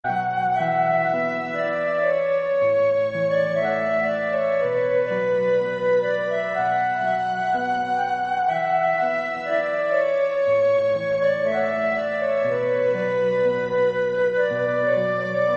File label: instrumental tamil; madhavan